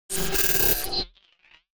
Disc Read

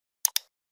Click

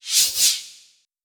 in and out zoom sound, whoosh

